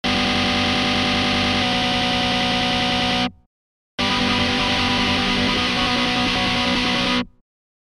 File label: machine gun